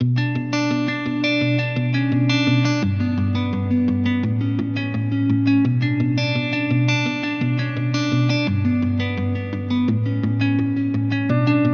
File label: 170 BPM B Min, Guitar Loops; Teardrops; Cymatics; Journey Guitar Loops